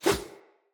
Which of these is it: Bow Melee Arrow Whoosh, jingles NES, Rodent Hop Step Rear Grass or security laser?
Bow Melee Arrow Whoosh